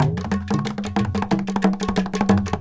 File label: India, Heart of Asia